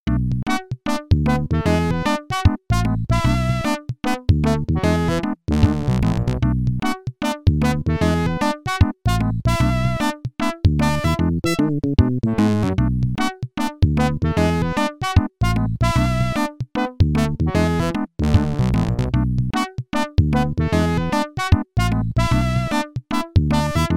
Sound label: race over; bc racers 32x game rip